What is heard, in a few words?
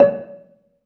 BELA C#4, Asian Traditions; USB Soundscan vol.60; AKAI